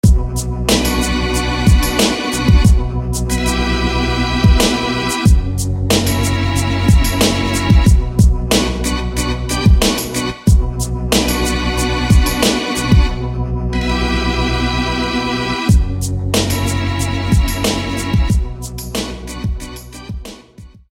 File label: Organ Soul; SFB FX